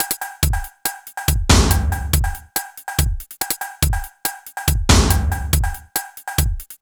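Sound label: drum loops, right; Big Fish Audio; Prosonus 500 Drum Loops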